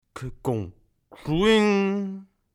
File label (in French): agraffeuse; Fourniture; aggraffeuse